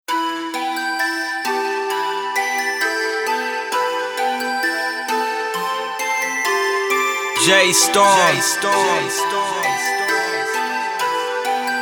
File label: RAP